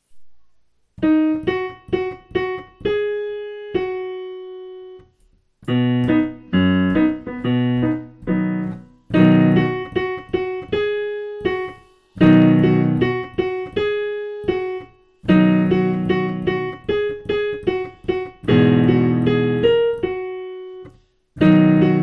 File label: piano